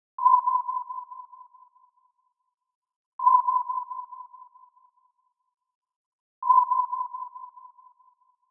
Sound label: Submarine Sonar